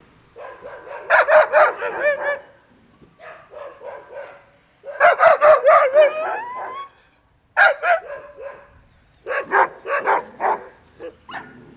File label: Dog Barks Howl Exteri